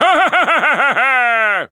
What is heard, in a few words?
Wizard Laugh